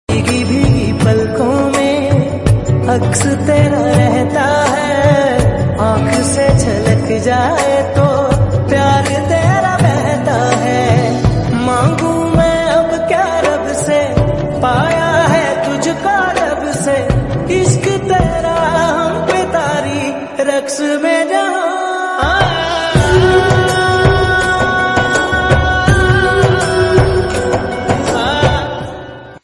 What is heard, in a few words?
Drama OST Ringtone; Khuda Aur Mohabbat